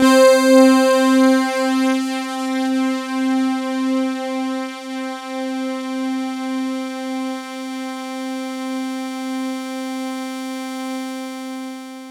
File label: C4, Synth Line; 90 s Sample CDs; NiceBeats; SynthLine VOL-31